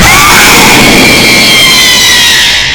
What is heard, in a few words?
jumpscare